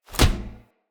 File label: Kick Impact Metal; Envoy